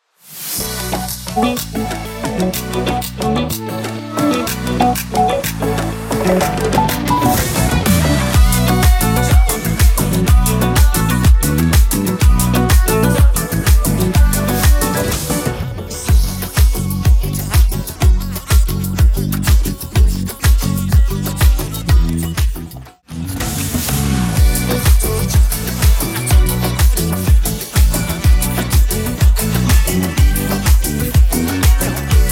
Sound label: Karaoke